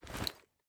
sight raise

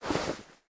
weapon draw

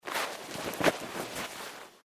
wear

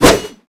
swing longblade; arisha

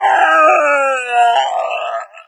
death, hazmat zombie; doom3

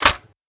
flaregun barrel open; tools

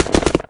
evade right no vo, wraith; doom3

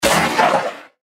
deathclaw death; npc

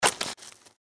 gecko jump; npc

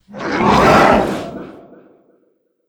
attack

